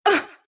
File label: pain, ru; dota2